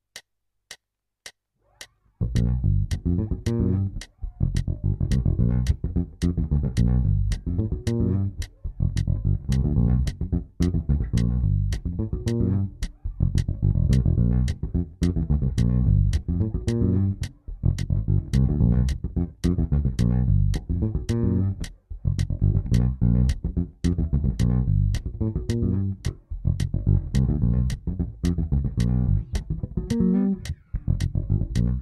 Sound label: Finger funk G7, Bass Finger funk in G7